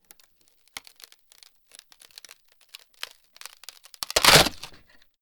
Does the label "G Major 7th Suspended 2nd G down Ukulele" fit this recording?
No